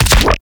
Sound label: Weapon Punch Shotgun; Warframe; Weapons; Shotguns